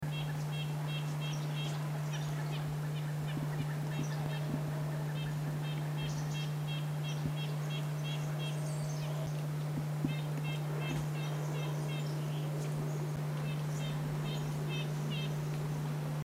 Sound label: Nuthatch Call, birds